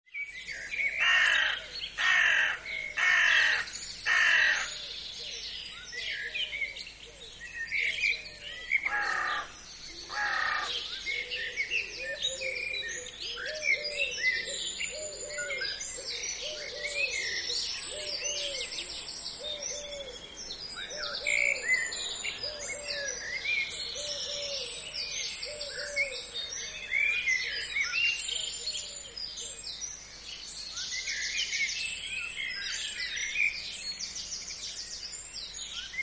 Birds Country 3 - Garden Birdsong - Close crow at start; Animals